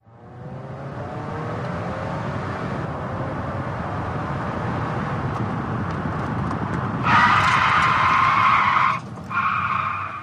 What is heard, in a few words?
Sedan Accel Tire Skd